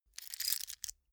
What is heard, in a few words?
key ring large grab soft